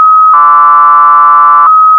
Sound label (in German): baud300, Daten per Audio übertragen